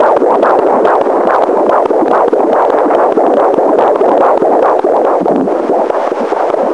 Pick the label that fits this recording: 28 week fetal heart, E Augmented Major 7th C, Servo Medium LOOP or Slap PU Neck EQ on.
28 week fetal heart